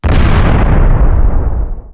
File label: Boom; Falcon4